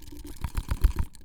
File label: Alien Chittering; BB Retail Therapy Sample Pack